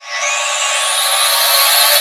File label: rise in